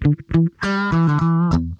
THUDDY, Funk Guitar; 90 s Sample CDs; Zero G

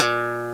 METH, FAIRLIGHT CMI IIX, BASS

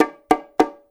FILL, Percussion

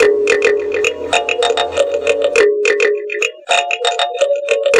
Mbira, Acoustic Beats; Sonic Foundry (Sony Creative Software); Myths of Technology

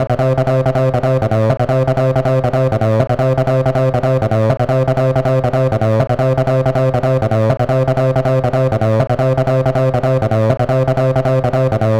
Acid Loops, 160; Dance Synths; Hardcore Loops

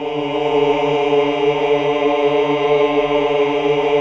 AAH, Hallelujah

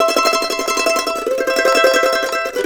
COUNTRY; Best Service Advanced Media Trax Modern Composer

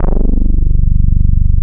CL BASS; East West - Global Sounds; THE CAT

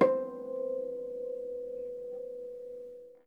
H C5, Percussion & Harp; Miroslav Vitous

